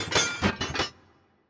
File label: Tank Metal; Legend of Keepers (gamerip) (2019)